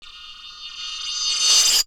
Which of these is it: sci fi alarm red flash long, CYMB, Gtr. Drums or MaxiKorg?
CYMB